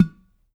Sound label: pot; Spectrasonics; Liquid Grooves; POTS + PANS